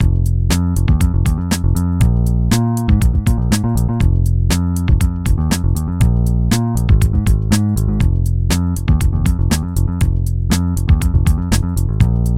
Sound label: bass